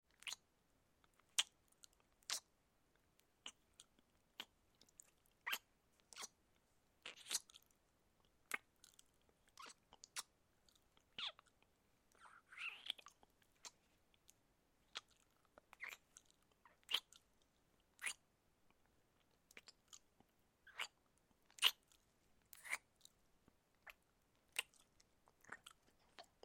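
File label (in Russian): сосание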